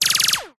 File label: science fiction weapon gun silenced multiple shots fast automatic